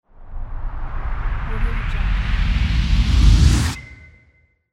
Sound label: uplifter